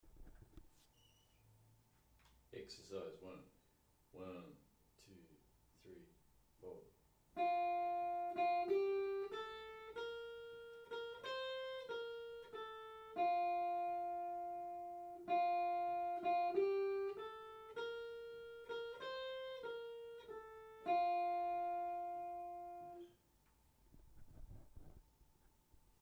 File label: Twin Guitar Solos